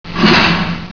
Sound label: tiger maximize; Desktop Themes 2000; Tiere